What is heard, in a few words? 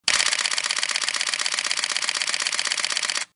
drive high speed, canon eos 1d mk iv